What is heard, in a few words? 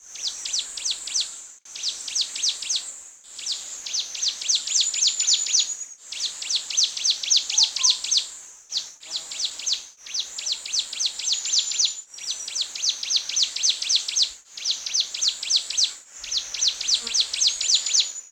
prinia rufescent